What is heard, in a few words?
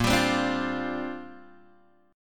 Bb 6th Add 9th Bb Guitar Open G